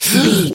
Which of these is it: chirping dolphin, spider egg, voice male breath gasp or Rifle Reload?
voice male breath gasp